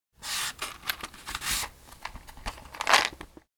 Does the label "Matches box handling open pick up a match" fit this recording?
Yes